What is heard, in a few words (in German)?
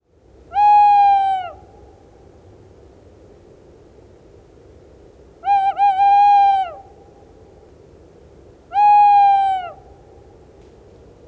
Eule; fisch-vogel